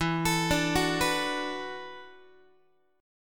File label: E 7th Suspended 2nd Suspended 4th, down, Guitar; E7sus2sus4 arpeggiated; x,12,12,12,10,10; JGuitar